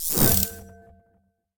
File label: replay popup; Bejeweled Blitz